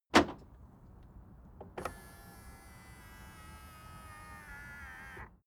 car unlock remote automatic

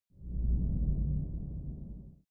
space shuttle pound metal reverberant; raumschiff

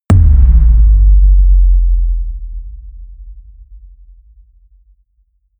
bass drop; whooshes rises; hits impacts

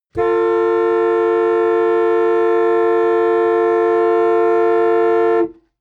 car horn long interior hummer h3

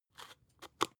spice jar adjust

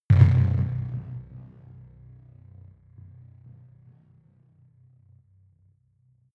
sci-fi vehicle engine stall massive; raumschiff

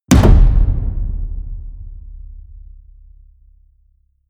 drum hit loose medium; percussion

